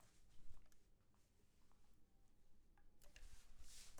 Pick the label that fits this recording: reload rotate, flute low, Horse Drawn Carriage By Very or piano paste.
flute low